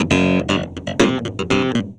ACID ROCK

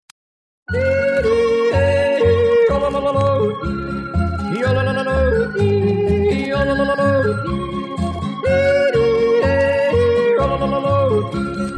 High Swiss Yodel, Old Country Music

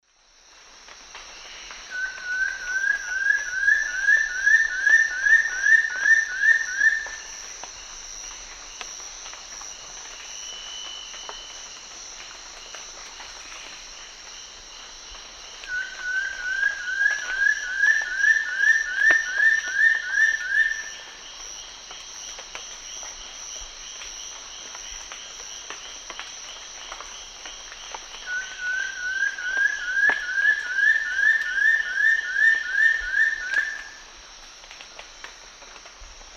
Frederickena unduligera, song; S. America; Eastern Lowlands

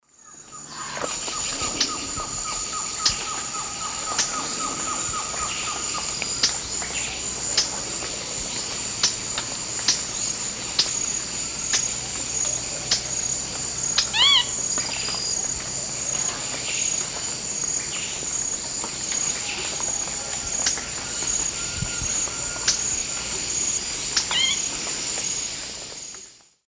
Synallaxis rutilans, call and subsong; S. America; Eastern Lowlands

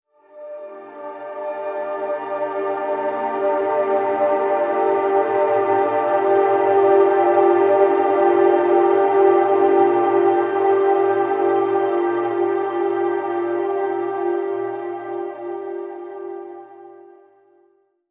ELECTROCUTION

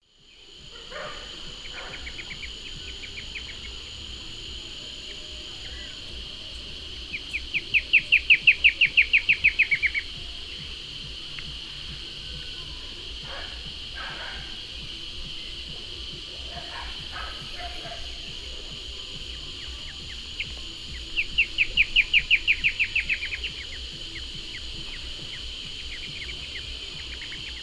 Myrmotherula ignota, song and chatter pair; S. America; Eastern Lowlands

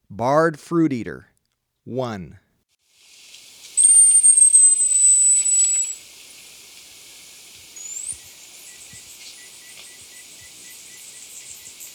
Barred Fruiteater; S. America

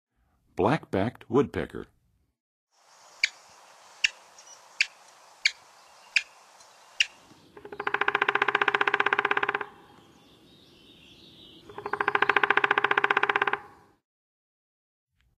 Black-backed Woodpecker, Songs of Birds; North America; Western USA; Western USA Songbirds

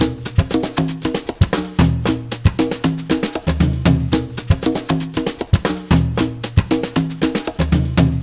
Perc, Percussion Latin

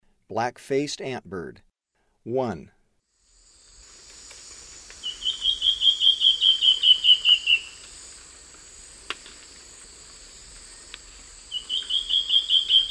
Black-faced Antbird; S. America; Eastern Lowlands

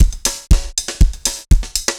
dance floor tight 120, loops; 120bpm

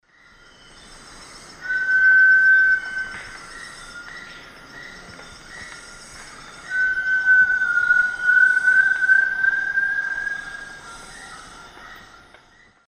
Crypturellus variegatus, song; S. America; Eastern Lowlands

